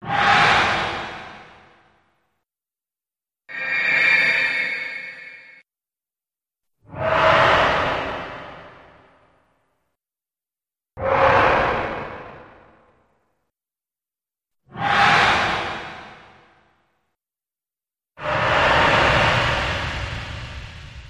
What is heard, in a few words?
Metallic Whooshes